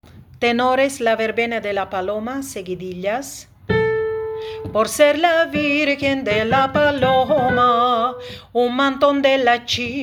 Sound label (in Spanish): tenores verbena